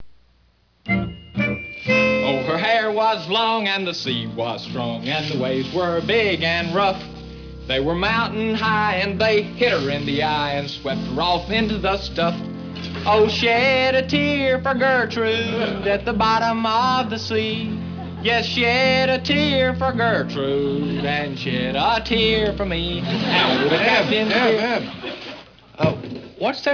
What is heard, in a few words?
Eb Folk Song; Eb Sings a Folk Song